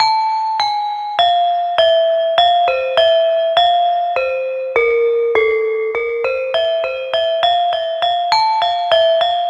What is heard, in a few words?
Pelog Unison Demung; Melodic; Ambient-Melodics-II